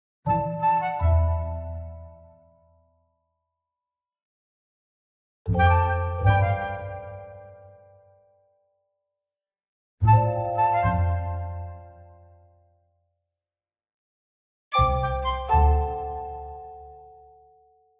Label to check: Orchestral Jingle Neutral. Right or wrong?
Right